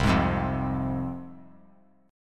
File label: C Suspended 4th Sharp 5th, C, down, chord